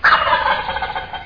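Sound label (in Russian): индейка массовый приглушенный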